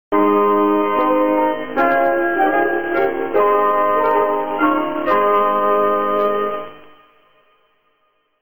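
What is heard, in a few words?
Radio Sofia Bulgaria Interval Signal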